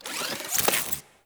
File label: Drone Switch Out; Warframe; Deimos; Cephalon Drone